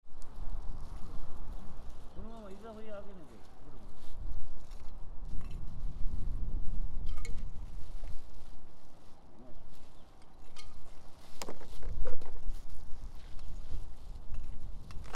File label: kanayagawa decontamination